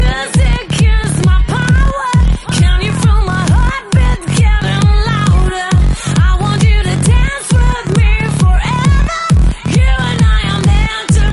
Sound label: House Vocal